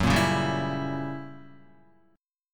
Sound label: Gb 7th Flat 9th Gb Guitar; strummed; JGuitar